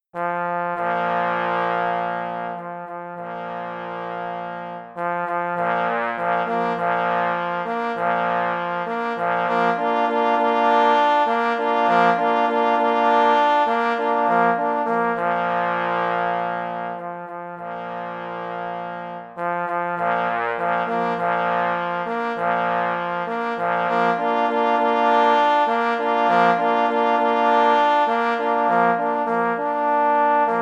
Hejnal, na 3 parforsy; Hejnal Plockich Mysliwych; Dr Marcin Kamiński (1913-1990) – płocki kompozytor, dyrygent, pianista

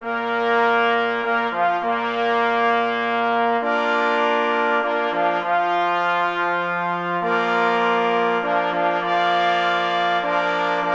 na 3 plesy i 2 parforsy; Cz. Trybus; Piesn mysliwych

